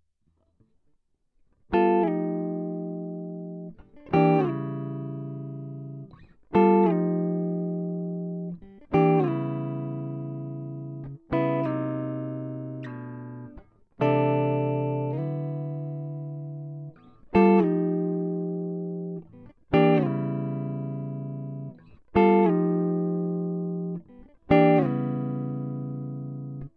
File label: E100 Jazzy Inside Chords Impro